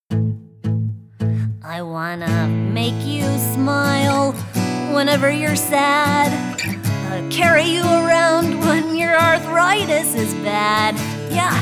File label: women singing